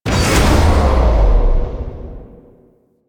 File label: Rally Cast Release; Warframe; Warframes; Hoplite